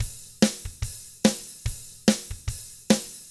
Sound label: ROCK; ILIO; Double Platinum Drums 1; 145 ROCK